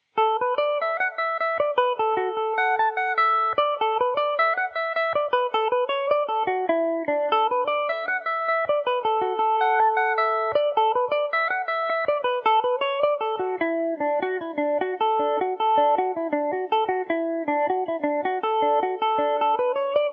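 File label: hags of ulster D major mandobird, mandolin; The Hags Of Ulster; Epiphone Mandobird VIII electric mandolin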